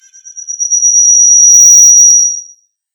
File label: Microphone Feedback